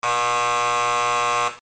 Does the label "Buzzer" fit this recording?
Yes